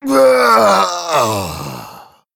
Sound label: Male Troll Death